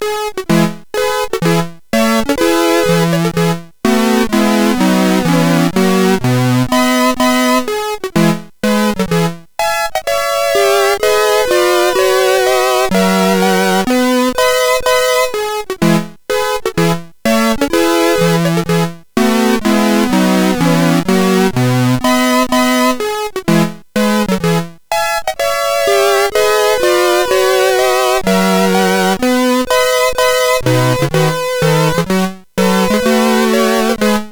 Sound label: sid CSG8580; HVSC; Adagio